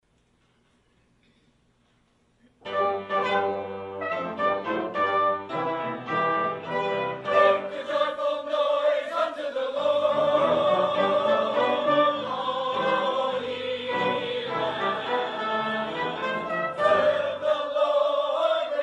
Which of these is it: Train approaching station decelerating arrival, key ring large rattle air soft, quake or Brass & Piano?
Brass & Piano